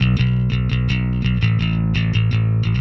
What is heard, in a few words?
Indierock Bass, Bbm, Punk & Indie; Rock Hard